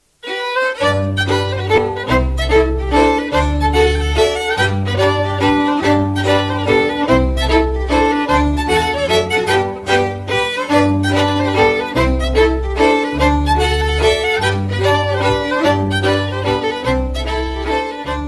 MAZURKA, Danemark

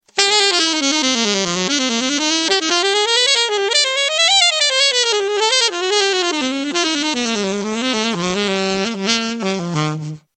saxophone alto

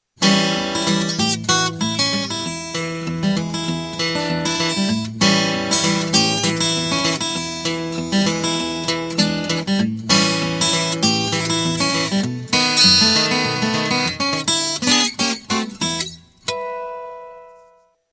instruments guitare acoustique

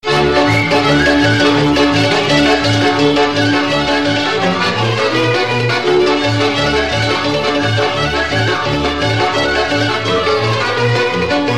Briulet Vilcean - Roumanie

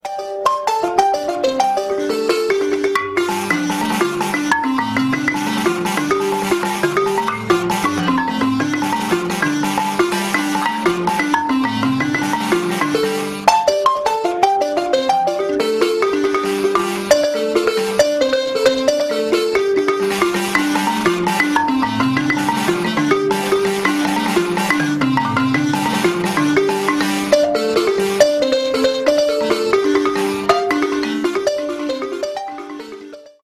balafon; instruments

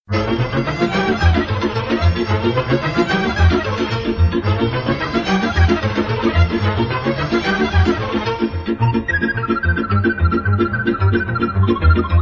Batuta Moldoveneasca - Roumanie

